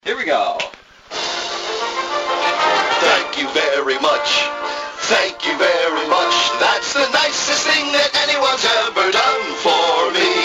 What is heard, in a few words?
singing promo out take; see's candy